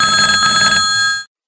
telephone

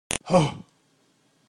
goat; vine

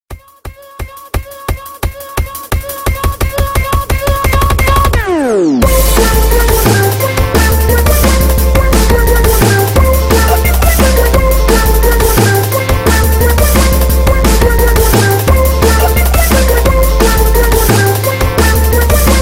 bass, electronic ringtones; pingu

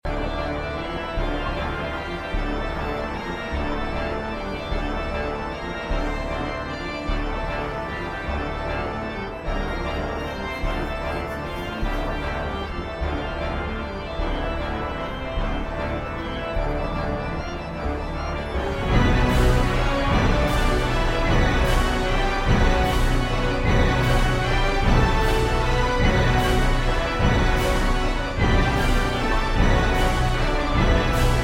organ

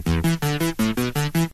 Synth

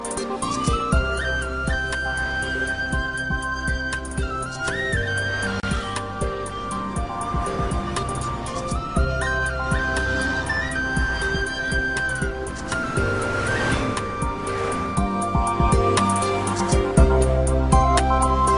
amazing flute tune; alternative

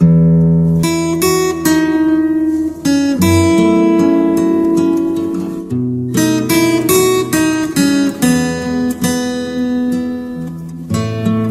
Guitar Laid Back, ringtone

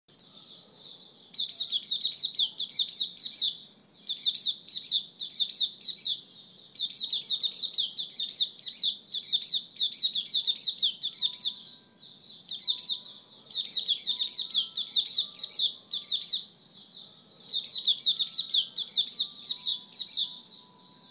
Singing

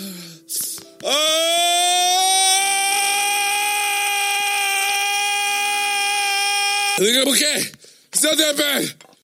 Aaaagh

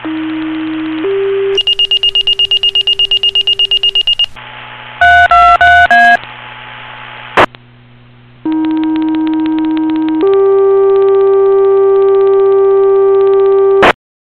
Pager Tones

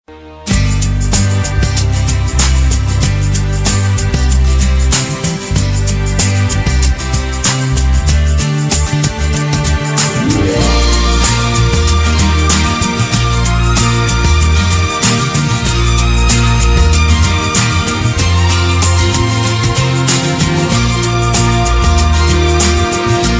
happy sms tone

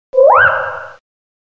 cry; Minccino

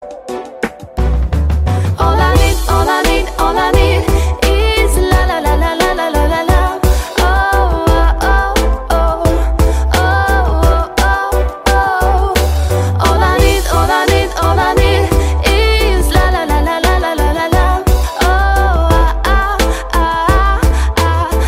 Is Calling RNB

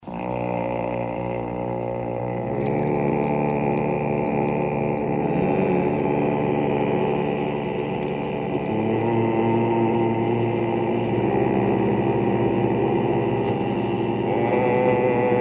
Chord

